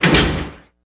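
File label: Elevator Switch Click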